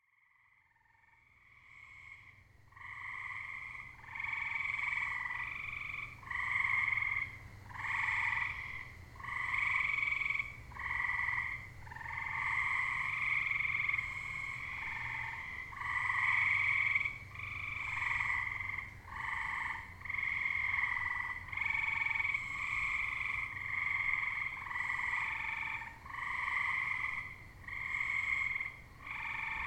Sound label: grey treefrogs; maynard